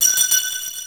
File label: Bonus; Captain Bumper 0.58